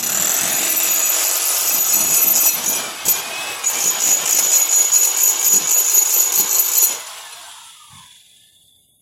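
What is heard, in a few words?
drills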